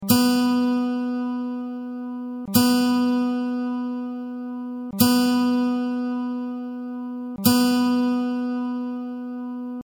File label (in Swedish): B-strängen, gitarrackord